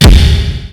k1, Kawai K1 Drum Machines; 300 Drum Machines

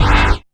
FLNG GTR LO

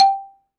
XYLOPHONE, Percussion; Synclavier; World & Orchestral; ILIO

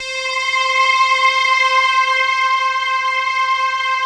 Microwave; Optical Media International; Sonic Images Library; All Micros

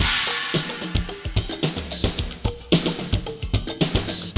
beat 120; AKAI S6000 CD-ROM - Volume 1

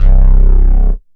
SYNTH BASS; SYNTH BASS 1; Megabass Remix! 2

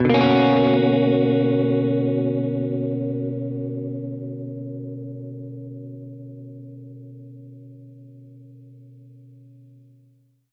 Gretsch Chord Bmin9[maj7], Guitar Chords; undercover samples

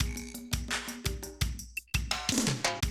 Classic DrumLoops

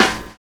60 S BEAT; Univers Sons - Kitch 70s

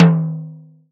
Spok Tom; Roland SPD-S

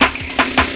Fill, Drum N Bass Percussion Fills n Breaks

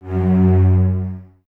Cellos F#2; Miroslav Vitous; String Ensembles; LINKS ORCH

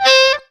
scarey sax; Zero-G; Funky Elements

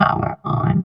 VOX VOX, Electro Vox

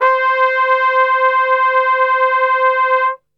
C 3, Brass; Best Service; Brass Super Section

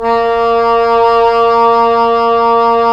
Cor Oboe; Roland - LA Composer vol. 4

